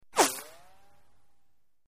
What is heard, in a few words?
Tonal Bullet Whizzes, bullet bys